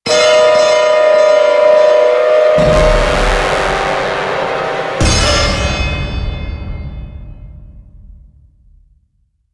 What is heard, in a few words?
String Large